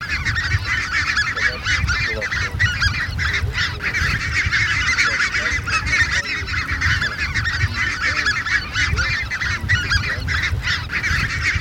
Казарка краснозобая Oca Collo Rosso; chip mini gusiniy 5B plurifon

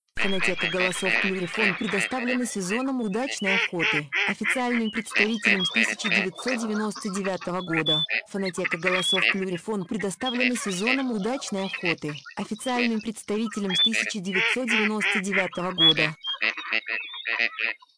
серая утка; plurifon

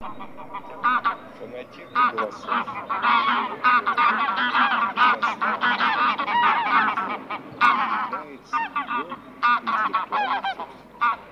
Гуменник базар Oche Granaiolle; plurifon

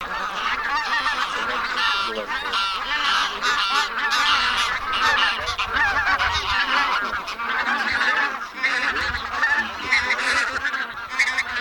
Гусь базар Oche Mix

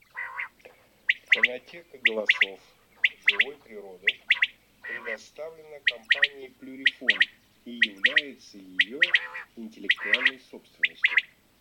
Перепел Quaglia; plurifon

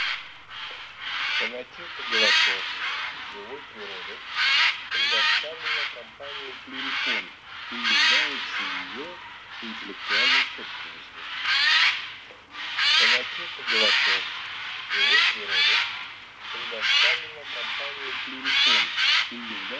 Сойка Ghiandaia

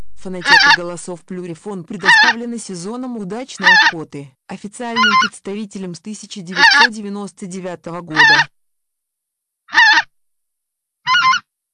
Гусь белолобый

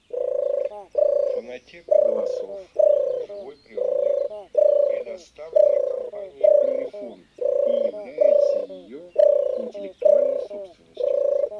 Горлица Tortora

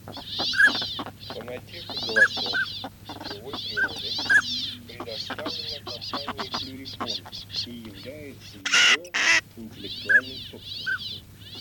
Шилохвость Codone; plurifon

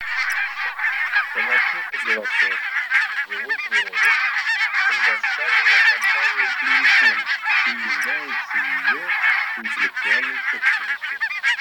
Казарка белощекая Oca Faccia Bianca; plurifon

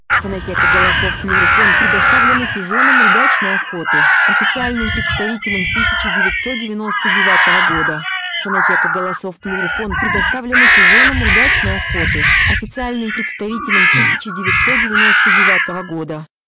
стая ворон; plurifon

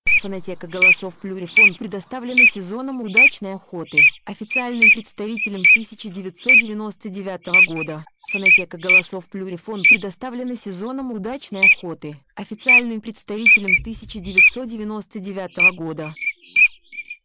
Чирок-свистунок; plurifon

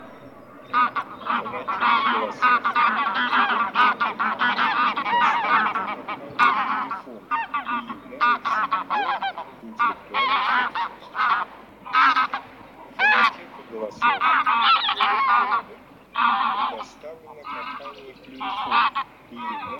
Гуменник базар Oche Granaiolle; plurifon

